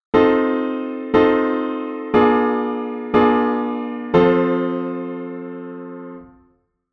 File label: plagal cadence IV 16; 16 edo theory; 16-ET